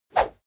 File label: whoosh small; swooshes-swishes